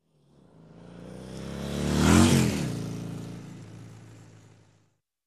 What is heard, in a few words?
Motorbike passing by